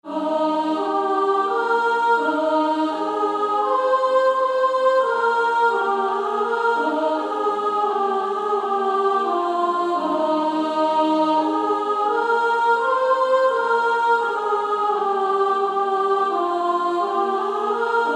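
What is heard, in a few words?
Female Choir soprano 1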